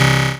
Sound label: Dance Mega Synth; Best Service; 90 s Sample CDs